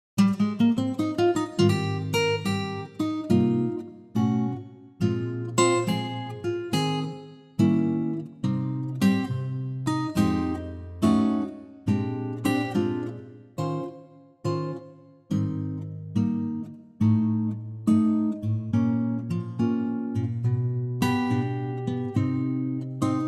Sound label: Ab ac gtr; key - Ab - vocal range - F to Bb